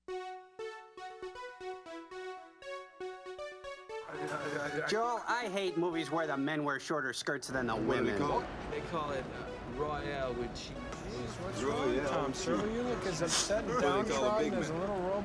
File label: cacophony, Organ Grinder